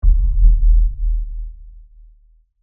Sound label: LFE Hit; Production Element; Rumbles; Sound Design; BlastWave